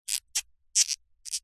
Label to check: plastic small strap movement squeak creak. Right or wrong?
Right